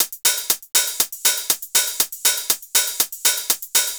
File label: Dance Drumloop